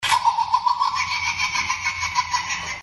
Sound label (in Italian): Risata